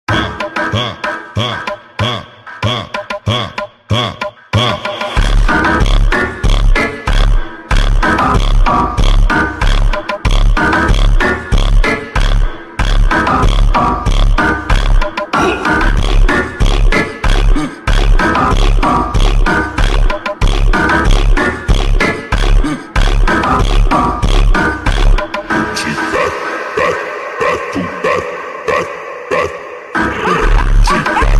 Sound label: best aggressive phonk, brazilian phonk